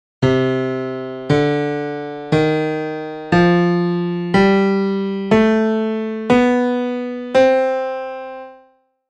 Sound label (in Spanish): Escala menor melodica